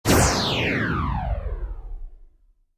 LASER SOUND EFFECTS FOR NEWSCASTER RADIO BROADCASTING; laserlaser